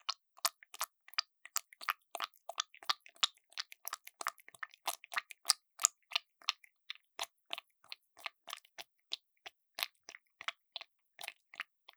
クチュ音 ノーマル～高速

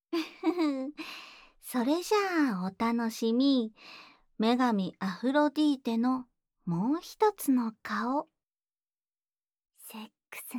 Ｗ耳舐め＆手コキ VOICE; Ｗ耳舐め＆手コキ』（VOICEver）

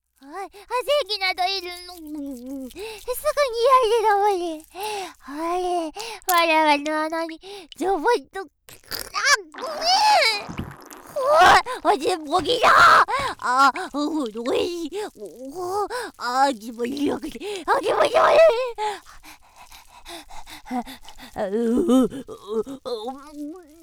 セックスシーン 効果音入り; 狐卵を含みながら

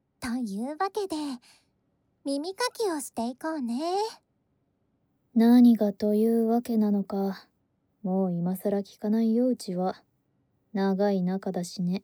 耳かき（左）＆お耳ふー＆スクイーズ, ASMR

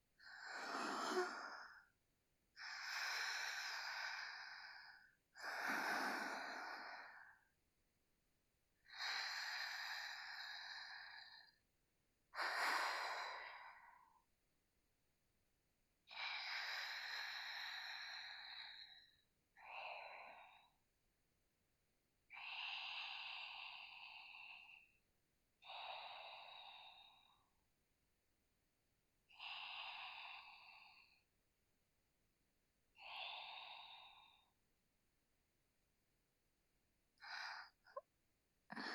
寝息（センター）鼻息

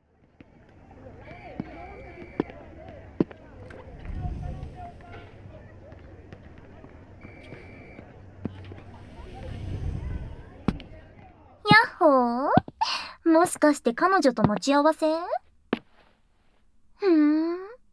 囁き・ディープキス, ASMR; 地雷系女子の悪戯; 寸止め手コキ

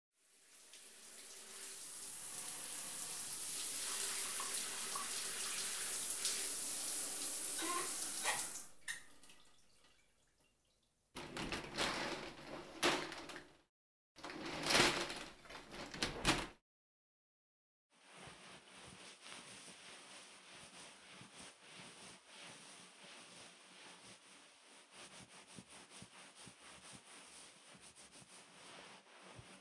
オイルマッサージ, ASMR; 鼠径部; メンズエステのヤリマンセラピストに性欲のはけ口にされた話

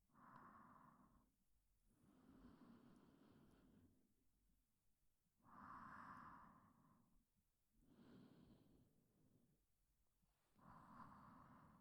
添い寝 音

